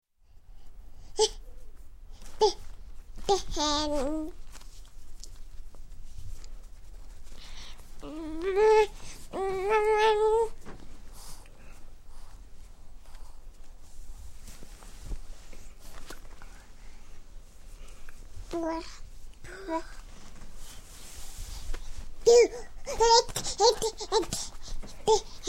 Baby Mother Changing Diaper, Baby Cooing; Baby Cooing And Gabbing; Sound Ideas 2000 Series

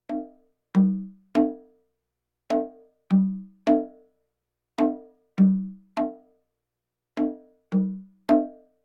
Percussion Bongos Tympani rolls Gongs Drums

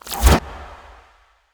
Ice Spell Utility Detect Magic; Utility Spells; Arctic Aura

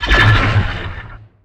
Pickup Scifi Health

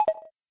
UI Pause; They'll Come from the Moon

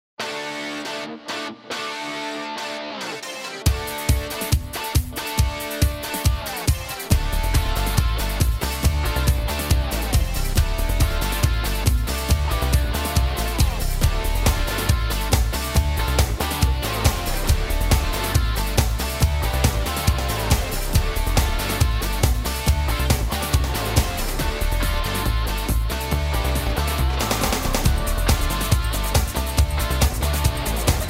SPORTS, Royalty Free Music; podcast production toolkit

